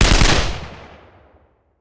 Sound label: Machine Gun; Sound Ideas; Guns